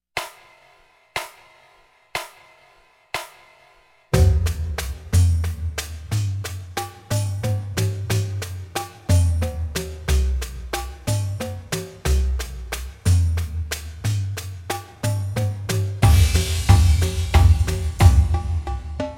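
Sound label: jamster slow, drumline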